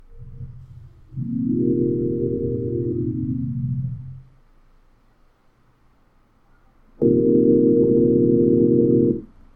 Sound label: Bats synth